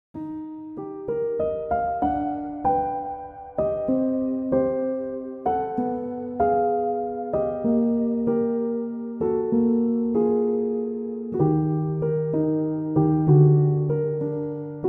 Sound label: Piano Lullaby Version; from “Toy Story”